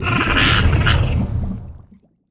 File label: lava; stellaris